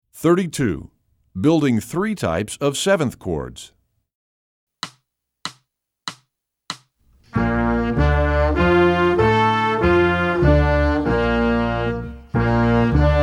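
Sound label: building jazz chords g major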